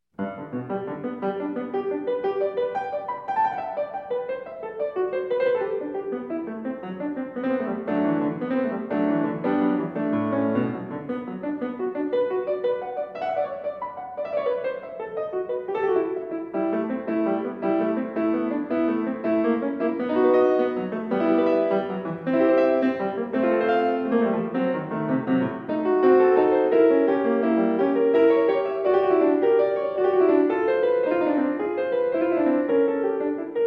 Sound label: Gigue, Piano Left Hand; Saint-Saëns, Camille (1835-1921); 1912 Op.135 6 Etudes For Piano Left Hand